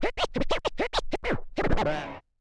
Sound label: OLDSCHOOL SCRATCH 96